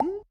Slime Vox Small

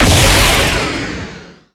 Enemy Waterplant Active Shot

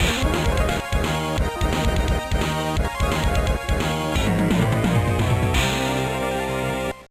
Unknown Fanfare

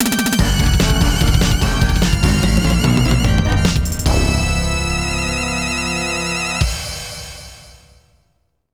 Game Start (LA)

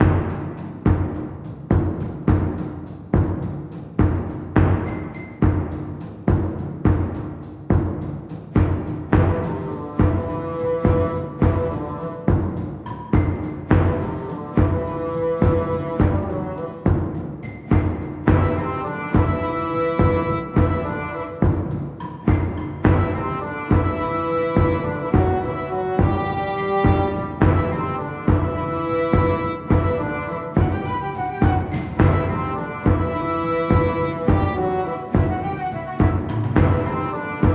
MUS Map Jungle

